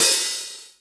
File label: Rock N Roll, E-MU Pro-Cussion